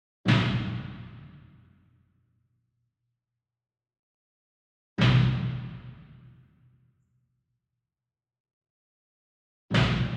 SINGLE DRUM Bass Drum Marching Flam Soft Beater Far; boom library; Cinematic Strikes Construction Kit